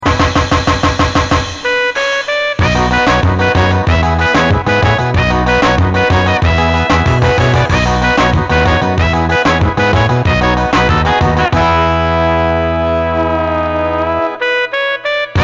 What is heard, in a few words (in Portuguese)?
ritmos profissionais